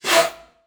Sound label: Pneumatic Brakes On In, Aircrafts; DCS